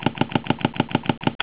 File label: switch